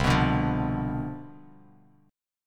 C Major 7th Suspended 2nd Suspended 4th C down, Bass Standard, chord; CM7sus2sus4 strummed; JGuitar